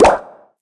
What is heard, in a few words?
bubblegun pop; Treasure